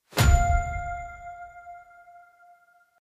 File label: transform Blink; finn